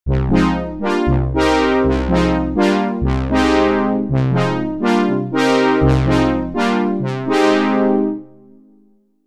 classic brass; ob brass; obx

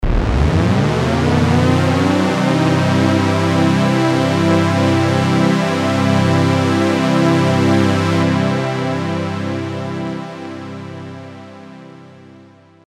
portamento; obx; vst audio; opxvst

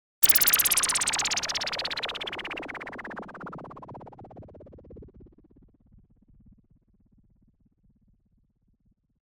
filter effect; obx; vst audio; opxvst

